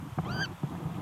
grive litorne, chant

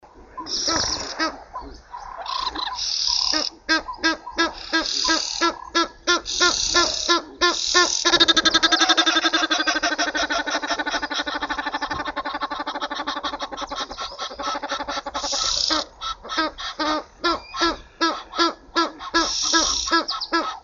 ouette d egypte

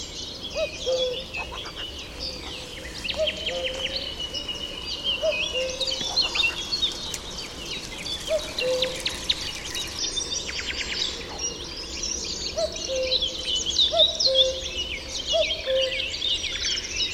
coucou gris; chant

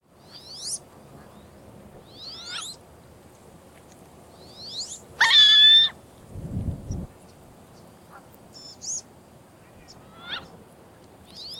canard carolin

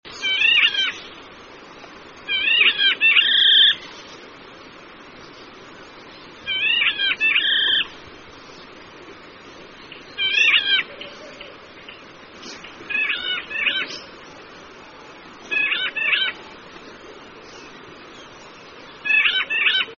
spreo amethyste, chant

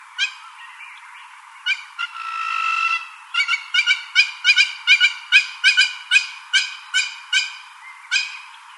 ombrette africaine

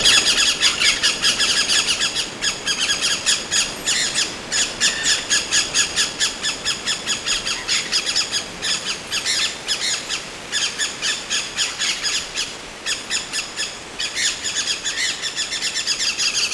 conure a ventre rouge, chant

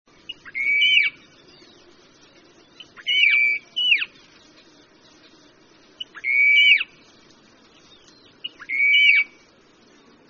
brubru africain, chant

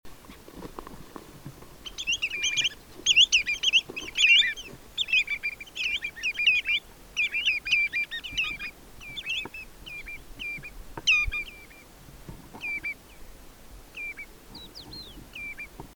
chevalier arlequin, chant

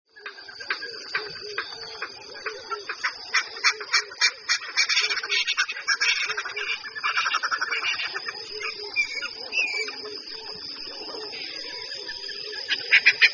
francolin a bec rouge, chant

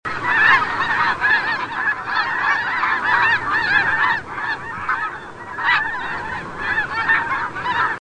oie des neiges

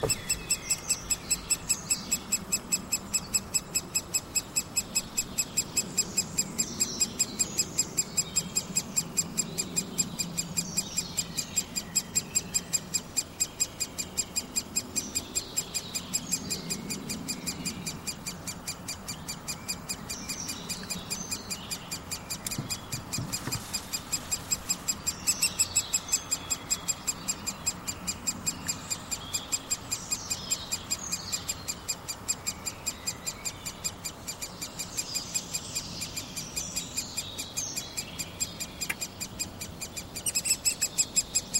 pic epeiche; chant

